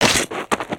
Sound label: Skullken Walk Run; creatures organic